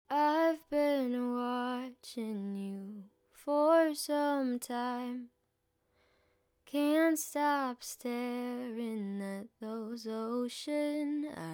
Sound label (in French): prise de voix